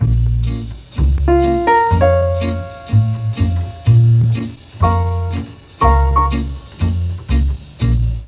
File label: A#1, Mellotron; Mike Pinder - Mellotron Archive CD